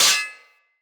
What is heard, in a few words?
Melee Sword Impact Generic